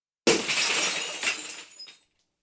spiegelglas-fensterscheibe zersplittert; Katastrophen

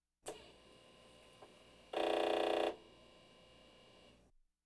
diskettenlaufwerk; Alltagsgeraeusche

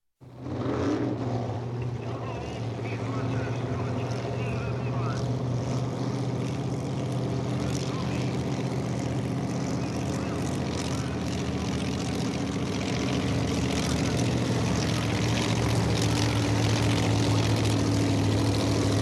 roadster aa klasse; Sport

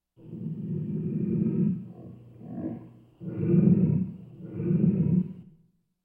löwe zoo

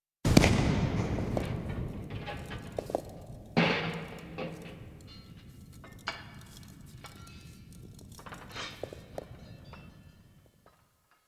dynamitexplosion mit fallenden trümmern; Katastrophen

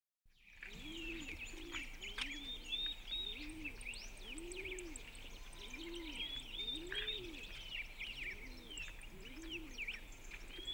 morgendämmerung, Auf dem Land

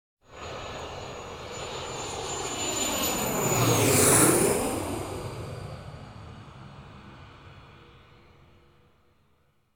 flugzeug im tiefflug (rechtskurve); Am Flughafen

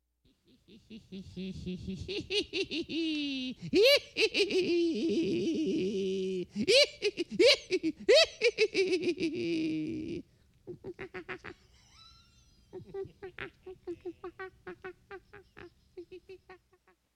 hexengelächter; Geraeusche; Lachen

